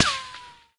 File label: whine, weapons